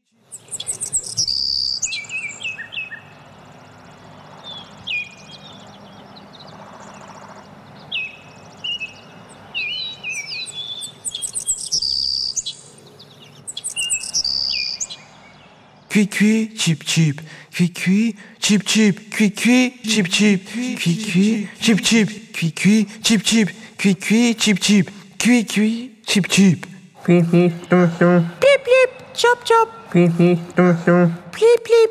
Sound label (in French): Chants d'oiseaux